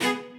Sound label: strings